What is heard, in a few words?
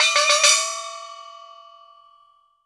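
SMALL GONG, CHINESE PERC; Spectrasonics - Heart of Asia CD2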